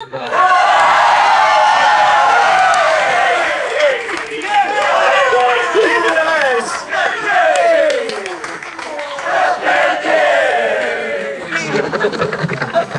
excited crowd cheering and applauding; crowds

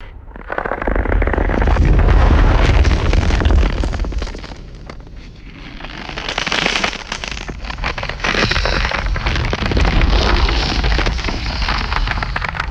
low pitched ice crackling and crunching

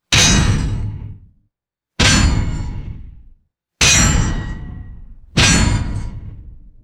heavy synthesized metallic mecha footsteps; robots

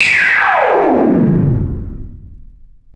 vintage sci fi ray gun blast; lasers

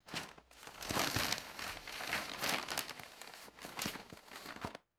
heavy plastic bag handling and crinkling

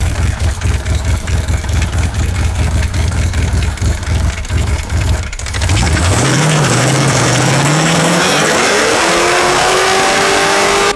rough car engine idling and accelerating

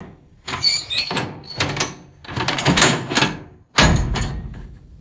heavy metal mechanism squeaking and clanking; mechanical

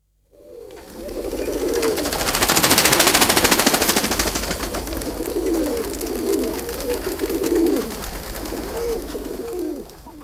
flock of pigeons taking flight; wings

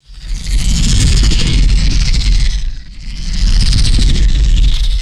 giant insect creature fluttering flyby; animals

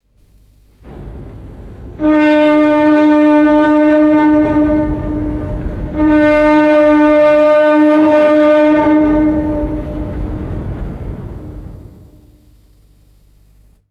three deep ship horn blasts; horns

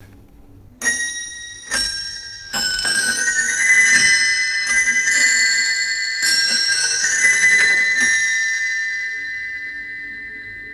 antique clock chiming quarter hour

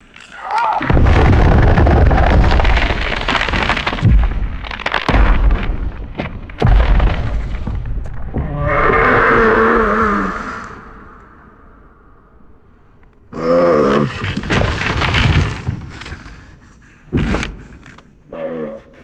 massive glacier shifting and cracking

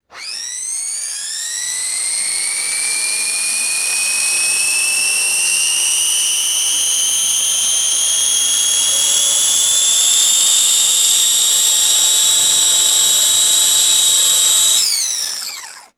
small rc helicopter motor whirring; toys

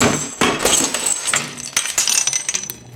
metallic tools clattering on hard floor

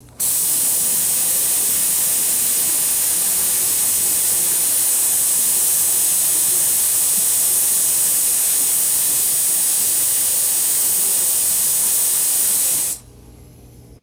steady compressed air can spray hiss

